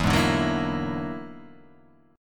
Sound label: E 9th Flat 5th E down Guitar Half Step Down